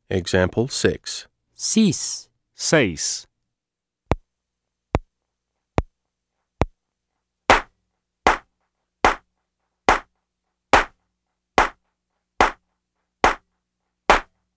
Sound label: Keyboard